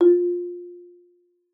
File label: MARP F4, MARIMBA; Xsample VOL-4